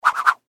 Whip Whoosh Multi, Throw; Foley; Fast, Small; Whip Sound Effects; BlastWave

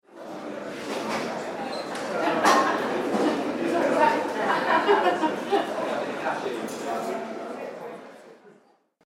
Laughter In Cafe With Ambience, cafeteria

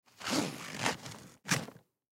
Duffle Bag Strap, Duffle Bag Strap Loosen; foley; Rustling Sound Effects; Objects; Blastwave

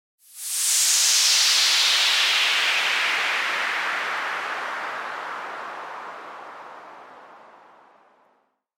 Air Escape, Hissing sound of air escaping.; Hisses; Objects

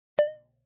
Blip; Beeps

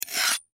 Knife Sharpen Single Stroke Metal Scrape; Knife Sharpen Blade; Hand Tools; Foley; Blastwave

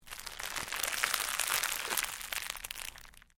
Cellophane crinkle

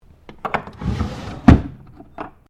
Drawer Cabinet Wooden Large Heavy Empty Open; Various domestic drawers; Household

